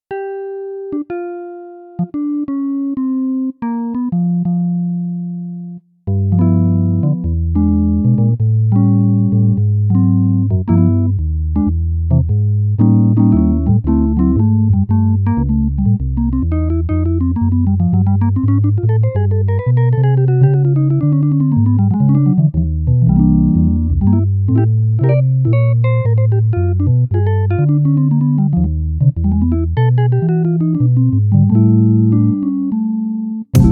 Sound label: VPM Jazz Gtr